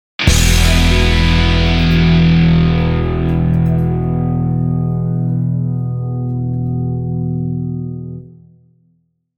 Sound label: Simple Rock Jingle; バンドで一斉に音を鳴らす①